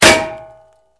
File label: metal; Mafie 1